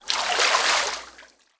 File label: Sfx Golf